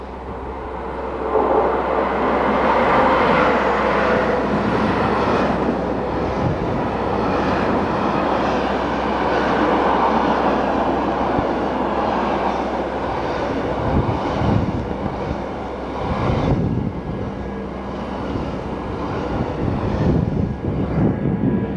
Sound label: train long